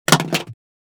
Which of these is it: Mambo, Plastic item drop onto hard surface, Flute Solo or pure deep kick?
Plastic item drop onto hard surface